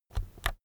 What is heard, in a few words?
keyboard external key press slow soft